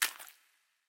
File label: footsteps mudroot; Fate The Cursed King